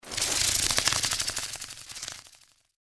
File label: hit sfx; npc; cazadore